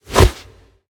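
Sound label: Power Melee; smite; god bakasura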